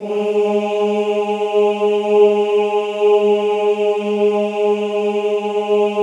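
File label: OOH G#2 right, classical choir; Best Service; Extended Classical Choir; OOH MIX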